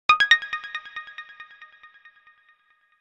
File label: Alert Fader; HTC AT&T Tilt 2